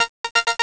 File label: arpeg; Amiga Soundtracker Sample Packs